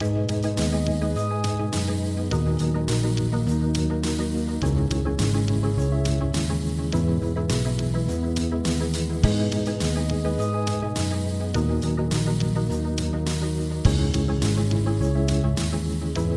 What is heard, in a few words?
Short loopable RPG VGM inspired boss fight battle kind of victorious; baby steps first steps